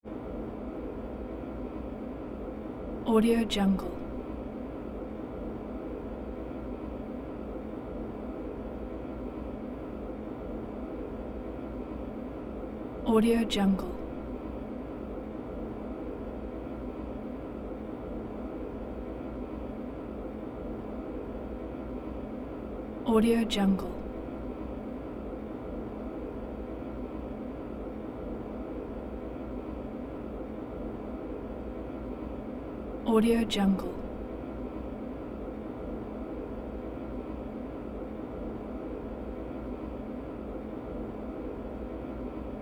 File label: ventilator deep rumble airy tone technical